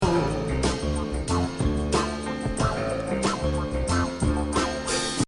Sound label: Synthetic 2nd loop with the ride